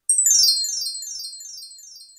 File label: Robot Fairy